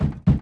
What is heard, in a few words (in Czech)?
poklep; Mafie 1